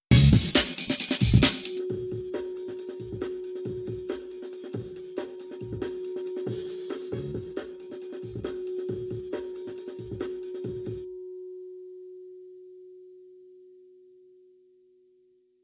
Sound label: bowl dry Wet; schoeps